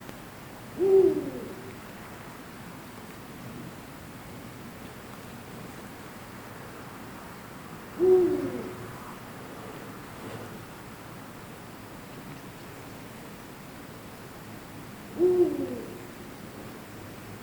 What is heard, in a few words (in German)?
Uhu; Oberallgaeu OA; Johannes Honold